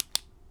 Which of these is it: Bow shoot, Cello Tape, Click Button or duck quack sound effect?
Click Button